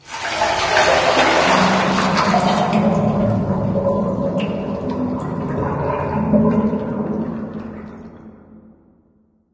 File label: ambient water drain; source engine; portal2